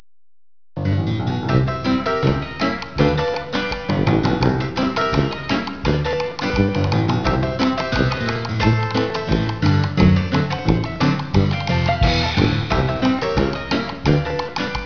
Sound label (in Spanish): MUSICA